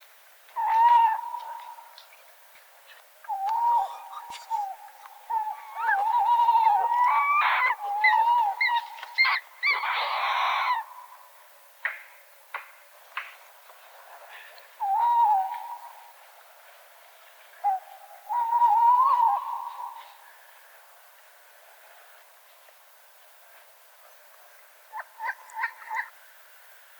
call, tawny owl, Strix aluco, Note that there is also a wing clapping, made by one or both the pair members.